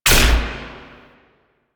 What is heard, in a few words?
Rifle Shot; Duviri; Incarnon Adapters